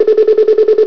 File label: pulse elec; EFFECTS